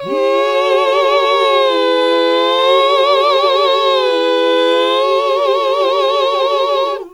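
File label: Gospel; Best Service Gigapack 1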